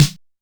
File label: Snare 505, drums one shots